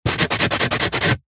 polystyrene strip thin rub scratch; foley; zapsplat

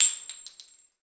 warfare bullet drop on floor; fork media

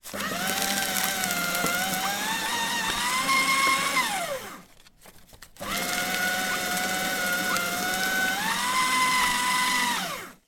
office paper shredder shreds 2 documents

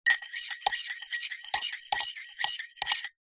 household teaspoon stir empty ceramic cup mug

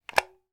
battery take out of charger

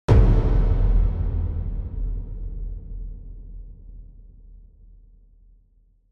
sound design hit cinematic big dark

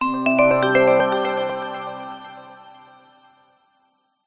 multimedia musical tone goodbye logout; zapsplat

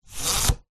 household blind roller pull; zapsplat

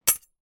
household knives large hit together

